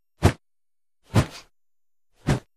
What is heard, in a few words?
Baseball Bat Whoosh; baseball fx & games